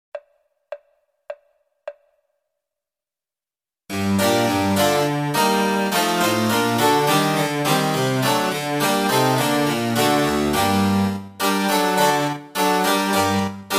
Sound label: oboe sonata; handel; hwv364a